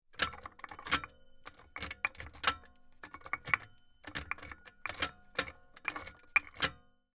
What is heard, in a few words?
Safe Dial Turn, Small; Miscellaneous Door Sound Effects; SoundEffects-BlastWave